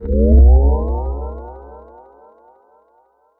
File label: deep upwards tech sound